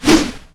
preattack; phantom lancer

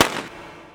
firecrackers; dota

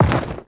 pennant drop, inventory; ui

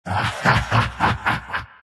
nyx laugh; dota; nyx assassin

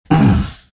pain, vo; arc warden

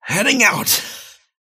teleport pain; dota; kez

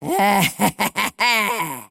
laugh; dota; muerta

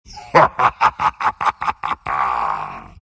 laugh, centaur; dota

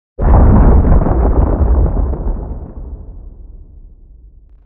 tower distant; building; damage

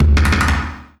drum hit; dota

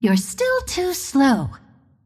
deny pain; Windrunner

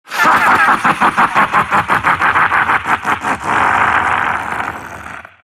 silt laugh; dota; siltbreaker

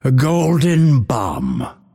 lasthit pain; dota; phantom assassin

